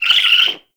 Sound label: Owl Idle Chirp; Warframe; Duviri; Glyph Puzzle